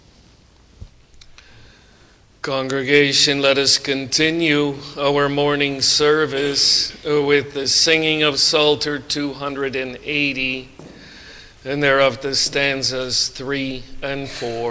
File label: Singing; jesus mercy to one taken with palsy 3